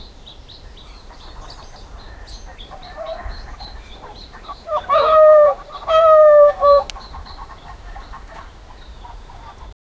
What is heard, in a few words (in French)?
animaux de la savane, savanna